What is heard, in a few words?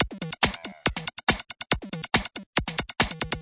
140 bpm, super electro, drum loops and breaks